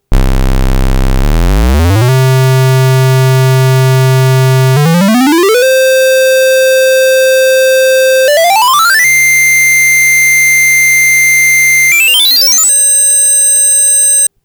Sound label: noisy square